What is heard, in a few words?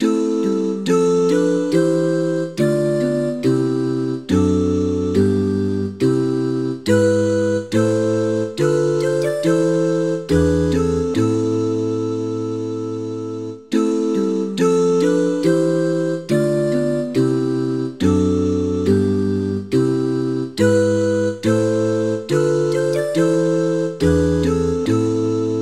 Bach choral tutti